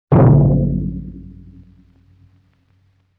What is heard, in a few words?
LoFi Bass Drum Hit; Vintage Cartoon; Drums & Percussion